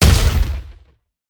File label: Nexus Fire